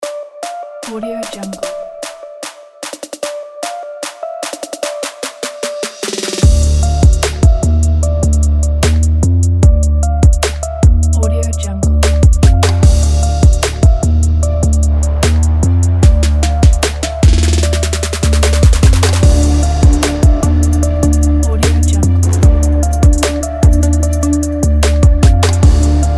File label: ambient trap